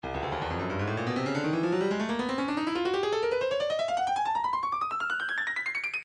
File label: Percussion Bongos, midi; Stylus